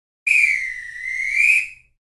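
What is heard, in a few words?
whistle sound of a whistle; звуки свиста человека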